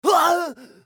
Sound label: scav wound; eft